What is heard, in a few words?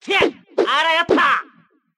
double slash; goemon